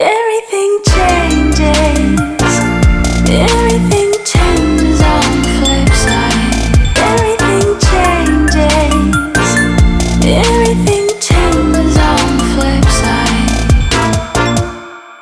trap

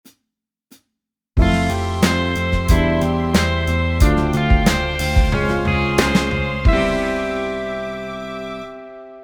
tritone sub maj7 pops

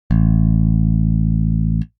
bass a1

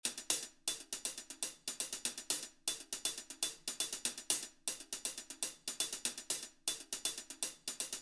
good hihat

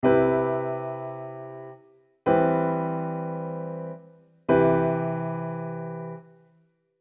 C maj7 bad

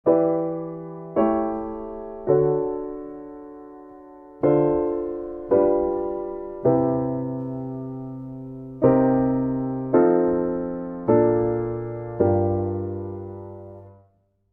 251 tuneup

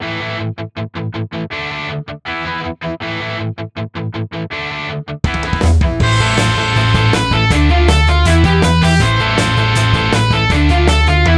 powerchord song minor

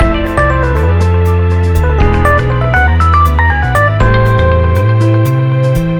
whole tone

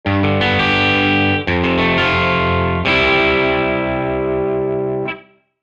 blues cadence

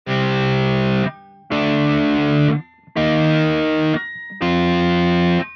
power chord sample

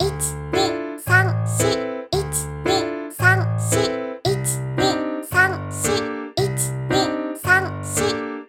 2 time piano count 4

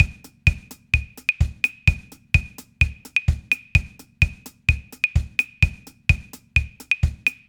clave

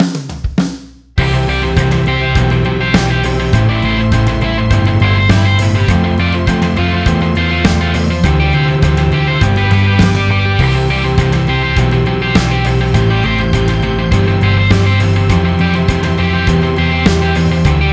IV hybrid

